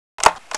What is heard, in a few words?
sniper reload; weapons